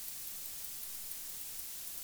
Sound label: unidentified bat species with am modulation